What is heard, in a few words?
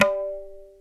INDIAN, DrumBase; Crypton - DrumBase5000